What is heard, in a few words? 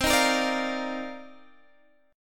C Minor 11th C Guitar Drop D